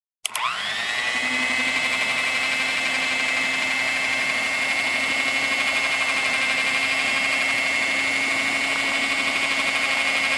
Electric Hand mixer turned on and mixing

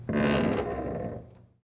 Old wooden shelf door creak

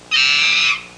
Gibbon scream sound

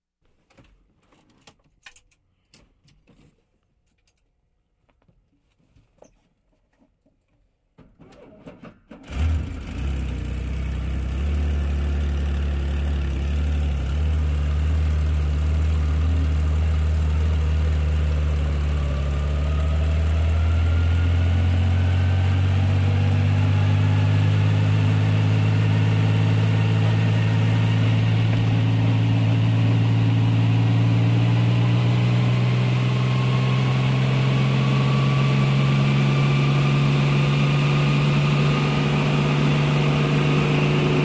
Propeller aircraft pilot engine start

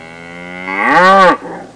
Farm cow moo

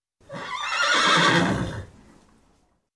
Horse Bray

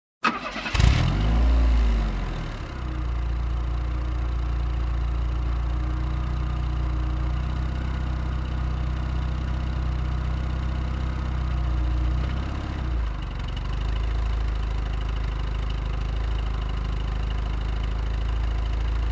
Car Engine ignition drive off

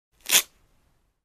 Ripping of small sticker

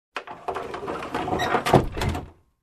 Truck Door Close

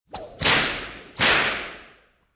Whip sounds in big space

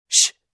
Female Shh

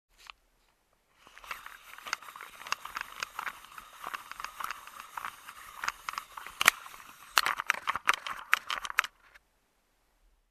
Polaroid Camera Printing Photo

